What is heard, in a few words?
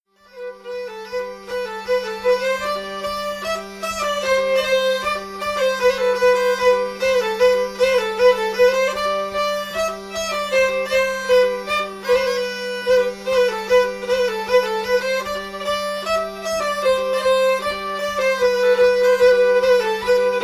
Bagpipers hymn; from Strakonice